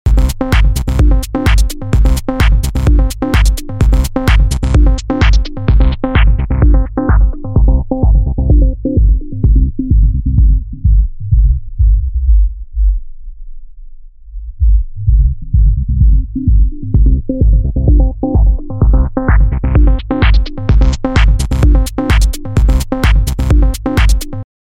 Loop Low Pass Filter

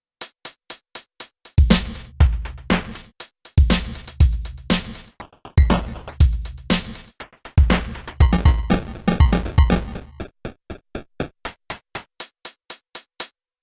Breaks; KOPRO+

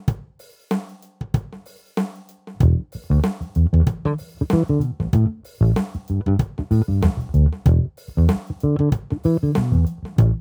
Funky Rhythm

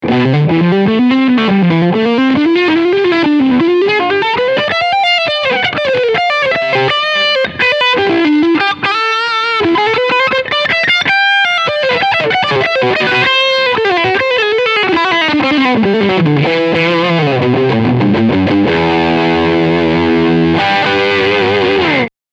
Big Dist

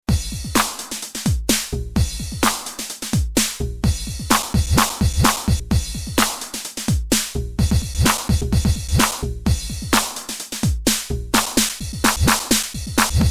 TR8 Scatter

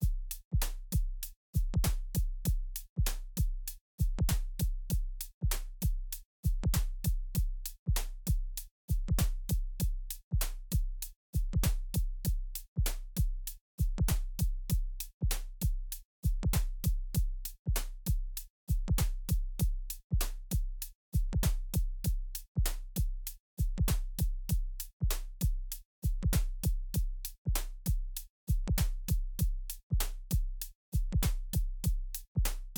Eventide H9000 Drum Omnipressor negative Function

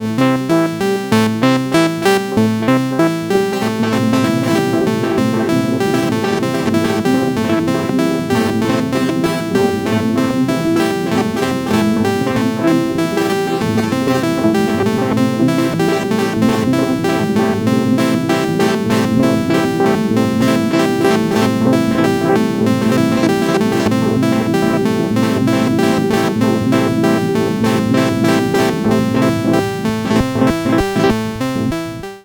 Filter Robot XOR Tri Coarse 0 100; Bastl Thyme

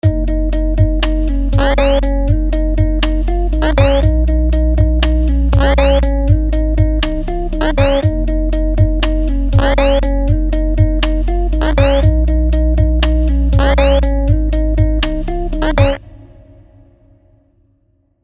Lifting Fill Guitar; CrushShaper

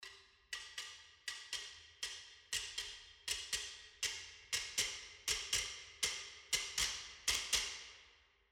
Click Ensemble Processed Tight; Cerberus

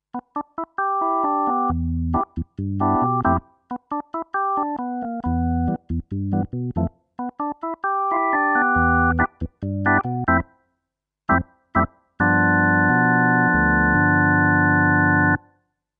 Kurzweil SP6 Organ 1

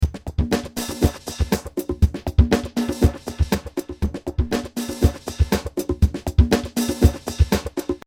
Funky Loop

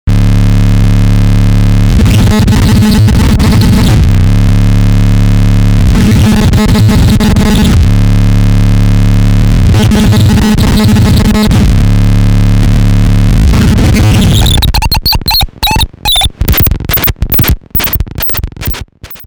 Thingamagoop vs. Big Muff

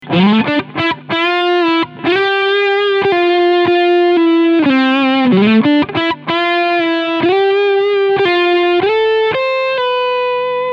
Source Audio ZIO Studio Overdrive

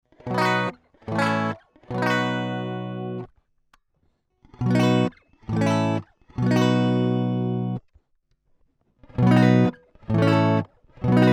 DI Jazzmaster 22dB Gain; Rupert Neve Designs 517

